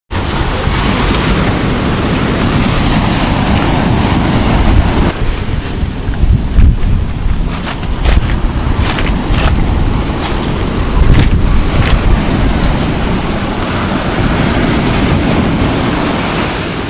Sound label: whitley bay sea shingle